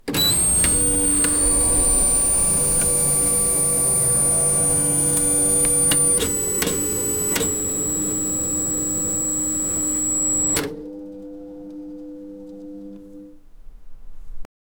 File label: fixing a delicate machine; mechanics